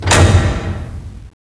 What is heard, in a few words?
MENU BTN; PC Gamer IT CD 35